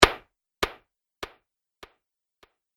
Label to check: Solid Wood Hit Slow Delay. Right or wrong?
Right